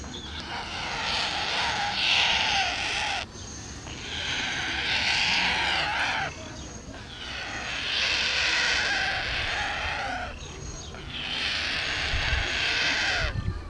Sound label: Ciconia ciconia